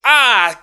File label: "ah"